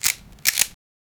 agarrar un objeto en un, game

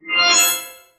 sonic logo 15s estilo pla, crystal chime

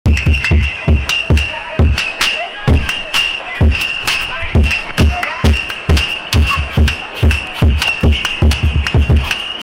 tambores en la calle como, festival

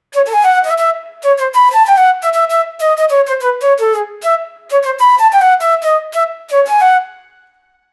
flauta sola; ethnic

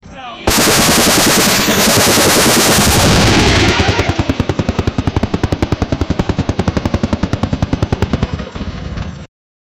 cohetes dispados y una pe, fireworks

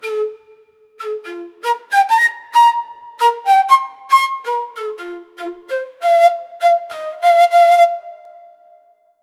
flauta sola enrgico ritmo

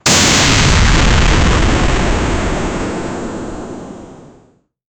misil perseguidor; launcher

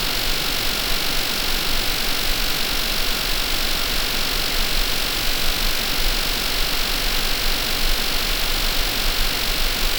rayos de poder manando, beam

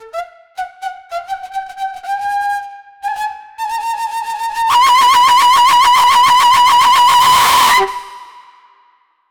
flauta sola la diablada, folk

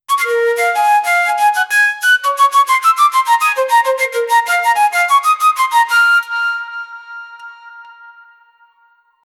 melodia en flauta

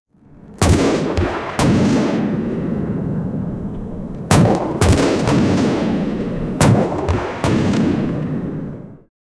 cohetes lazandos y que ap, fireworks

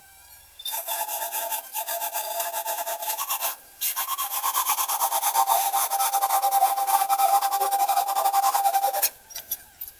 pincel de cerdas sinttica

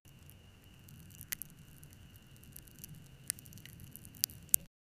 sonido de un basilisco, crunching

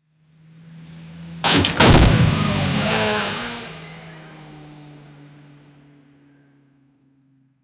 automovil deportivo estre, wreck

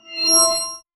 sonic logo 15s estilo pla, crystal chime

